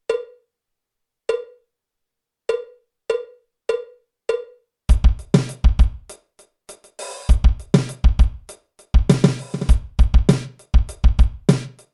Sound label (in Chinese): 架子鼓独奏 爵士融合; 66条架子鼓独奏SOLO